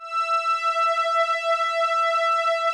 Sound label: OBERHEIMS